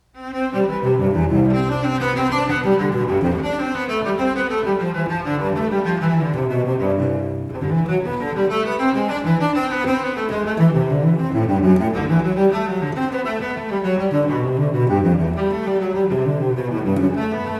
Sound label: C major, Courante, Classical High Spirit; Suite No.3